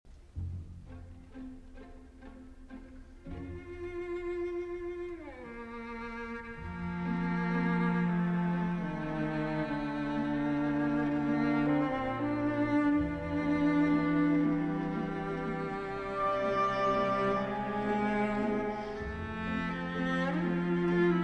Cello Concerto in A Minor, Langsam - Etwas Lebhafter - Schneller; Schumann; Op. 129